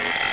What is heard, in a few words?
med shot no; items